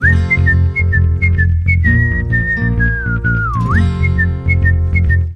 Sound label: Whistle March, Sting